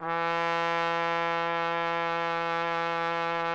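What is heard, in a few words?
TPT, trumpet; Roland - LA Composer vol. 3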